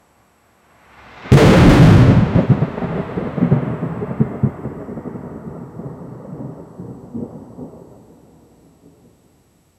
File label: sudden crack of thunder; electricity